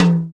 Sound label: TR-505, digital; 90 s Sample CDs; Sound & Vision - Gigapack I CD 1 (Roland)